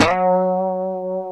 F#3, guitars; COMPR STRAT; Spectrasonics; Hans Zimmer Guitars VOL-1

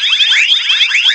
STARSHIP; CMI Sound Library Rev 1.3; MACHINES

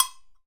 Agogo Low Short, Drum Machines

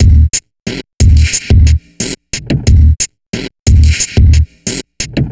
Noise Beat; Drums & Drones

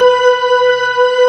P-ORG B4 L, POWER ORGAN

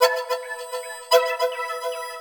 Synth Chiffin, Drum N Bass, Keys Pads; Sonic Foundry (Sony Creative Software); Knutrix Drum & Bass

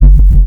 Nice Sub Neuro, bass

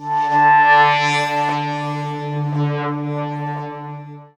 SYN Slowcc; Best Service Gigapack 1

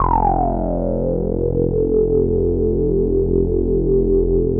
SPLIT SWP F2

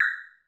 Korg 01W Dance Kit; 300 Drum Machines

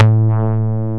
DIGITAL BASS, BassGuitar

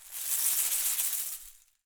SHAKER, AFRICAN SET, Percussion; Voice Crystal - Steve Reid Definitive Percussion

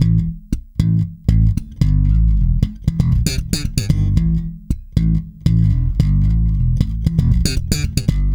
THUMB A, BIGTHUMB, Bass; Spectrasonics - Bass Legends CD 2

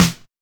SD BONUS, Drums

